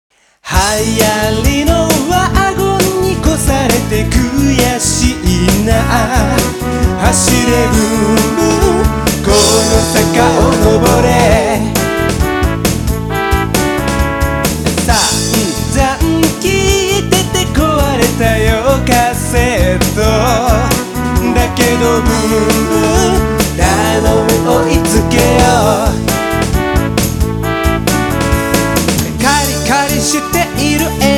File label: Newfolk; pop n music 2; dreamcast